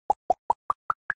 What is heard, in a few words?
pop combined